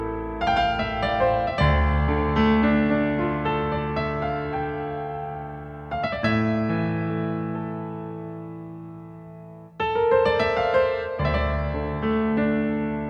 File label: Violin